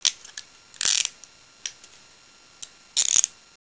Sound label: handcuffs